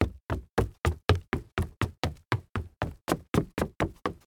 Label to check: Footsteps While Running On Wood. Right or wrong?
Right